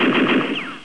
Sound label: Gun Shots; America Invades!